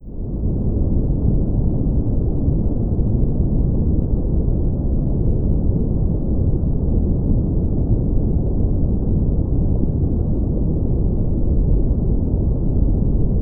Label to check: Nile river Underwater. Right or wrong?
Right